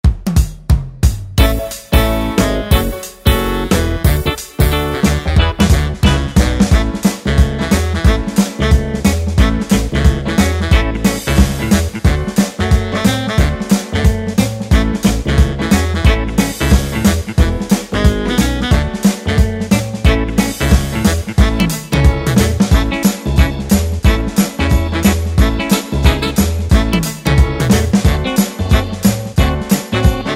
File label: 60s Vintage Style